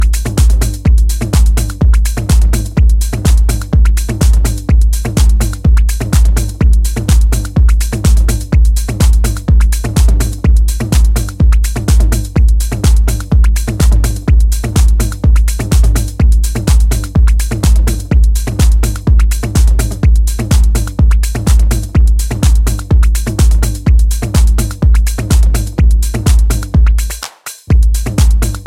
Alarm (Chirp mix)

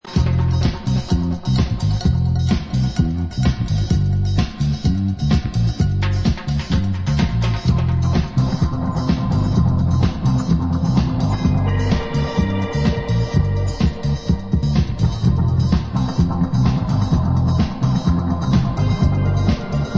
afro punk reggae; Disco

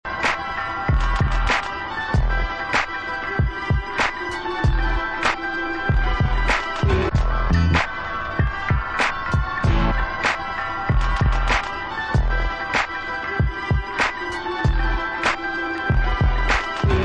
spanish bells; Stones Throw

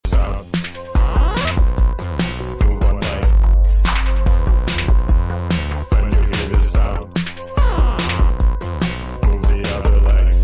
robot movements (vocal instrumental)

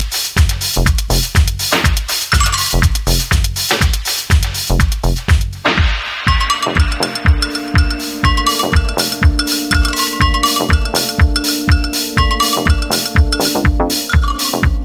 Reggae Drums

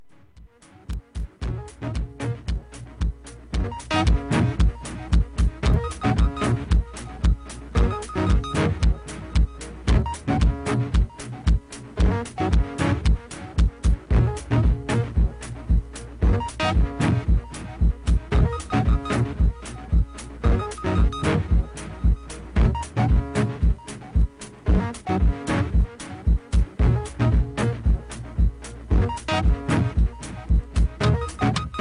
dirt rhodes

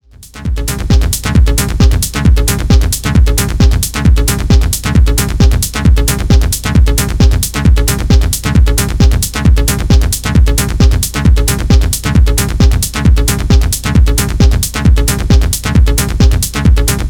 Loop (locked groove)

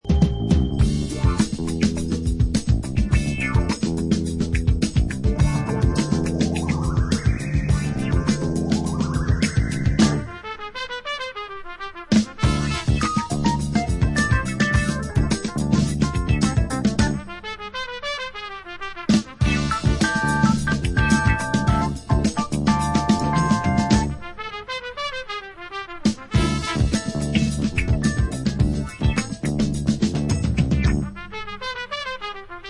funky tramway

